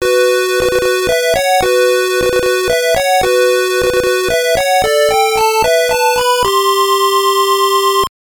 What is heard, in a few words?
Jingle; Pachi-Slot Hisshou Guide GB; SGB